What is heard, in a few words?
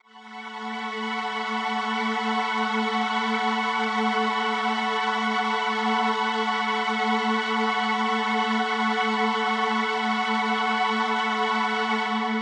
Mach String A6:9, synth orchestra